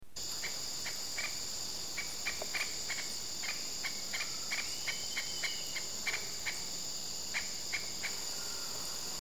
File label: Green aracari